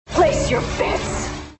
Exclaim

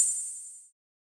RIDE

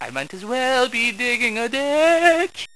diggin a ditch; Spades Deluxe; Jared

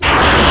sizzle; Uprising

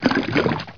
paint apply

